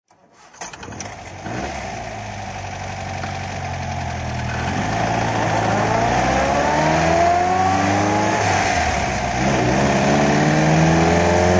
MG TD drive

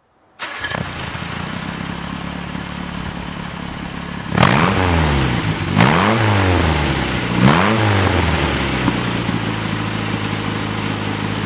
MG Midget throttle

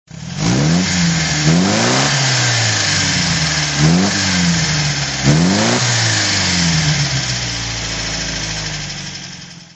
MG TD throttle

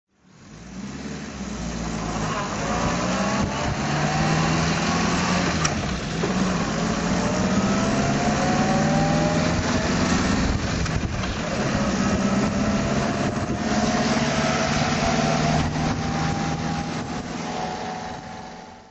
mg ta drive

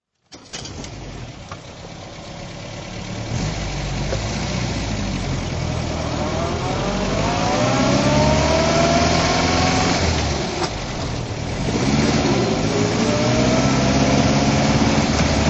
mgtb drive

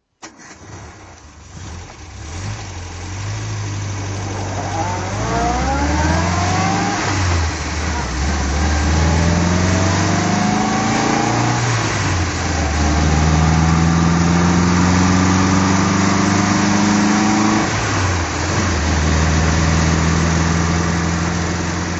MGA 1500 drive

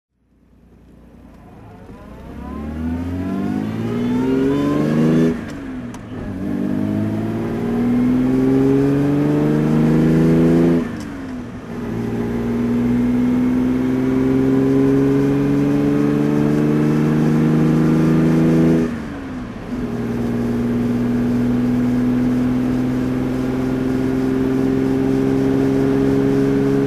ac aceca drive

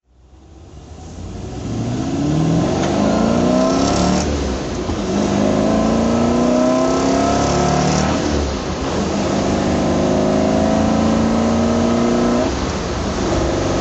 mg mgb gt v8 driving

